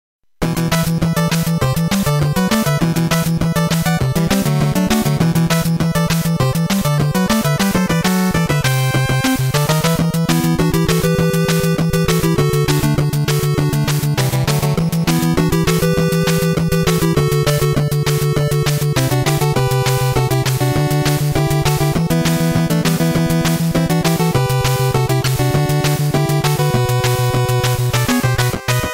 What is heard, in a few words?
nes area c bgm jp version; shatterhand music